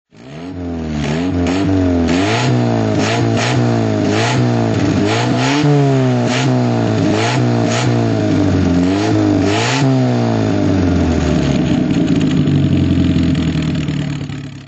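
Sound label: lotus elite throttle, Engine sound; Lotus Elite S2, 1960; V3D/ ClassicarImages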